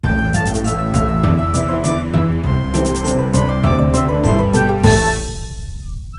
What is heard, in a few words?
rescue start; RuneScape 3